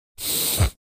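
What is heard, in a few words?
nose blowing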